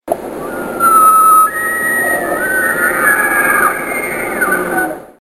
moose

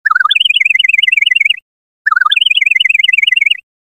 Bird Chirp

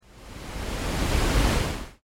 water

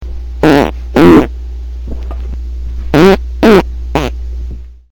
fart

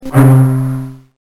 bamboo

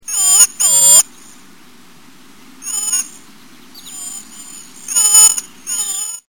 cedar waxwing

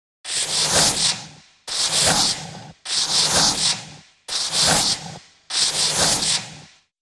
Scouring Pad Cartoon; Stereo

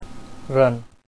run

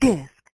disk

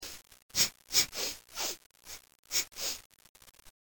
sniffle

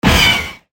barret m82 fire

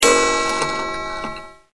clock

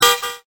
Car Horn

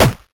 hit

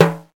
TIMBARIM

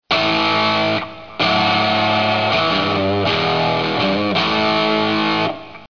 startup

